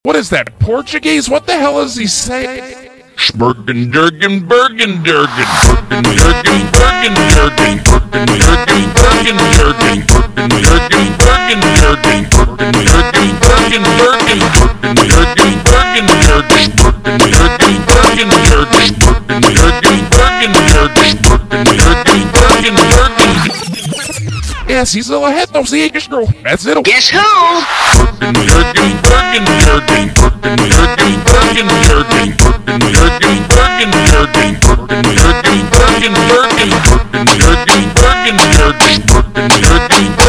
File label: BREWSTREW FUNK Slowed